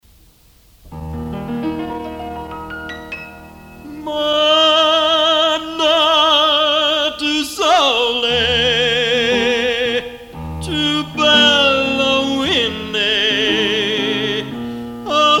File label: o solo mio